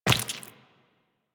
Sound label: Step Flesh, Footsteps Walk; Warframe; Tenno